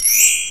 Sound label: CHIME, Kawai R-100 Drums